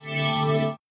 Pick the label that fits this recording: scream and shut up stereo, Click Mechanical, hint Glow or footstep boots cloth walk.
hint Glow